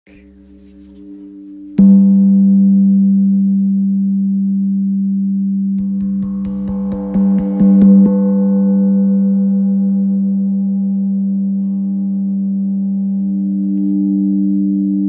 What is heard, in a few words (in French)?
Chau gong sol 40cm